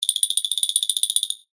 Teeth Chatter, Cartoon, Teeth, Chatter, Rattle, Skeleton; Cartoon Sound Design; BlastWave